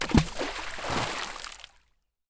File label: Water, Splash, Rock, Throw In Lake; Backrooms 2D